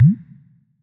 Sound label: wrap, ChromeVox Earcons, Reverb